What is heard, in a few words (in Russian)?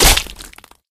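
попадание точно в цель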